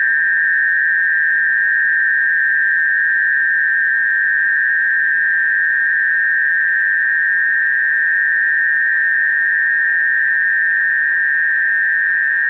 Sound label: PACTOR-II FEC; Betriebsarten